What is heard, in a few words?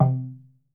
Log Drum - C; percussion; Cymatics - Infinity Beta Pack 2.0; Zeus Percussion Collection